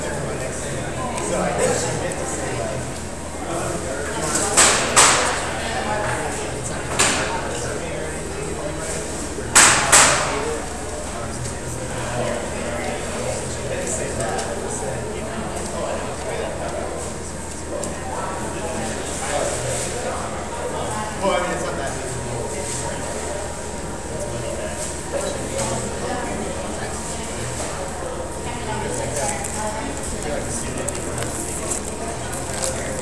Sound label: ambience